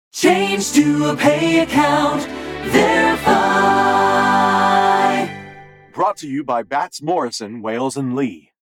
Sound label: Jingle